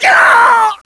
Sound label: pl death, player; bts rc